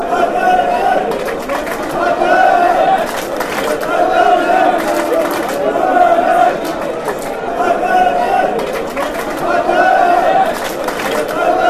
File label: clap, fanchants; hibernian